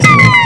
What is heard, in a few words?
las trig; zaero; weapons; ired